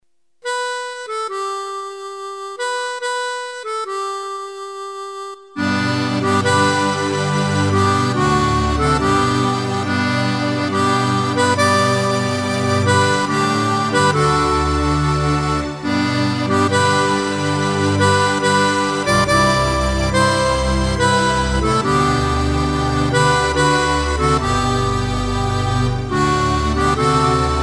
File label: instrumental